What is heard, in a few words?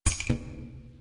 percussion